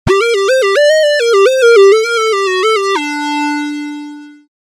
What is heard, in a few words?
solo; opx vst